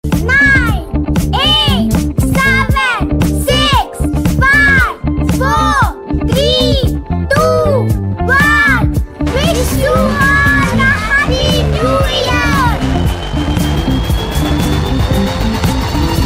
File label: Happy New Year Ringtone